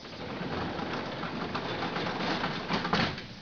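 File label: garage door close; 12000 Graphics Pack - A Complete Graphics Collection For Your PC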